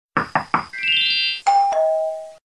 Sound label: Door Bell Sms Alert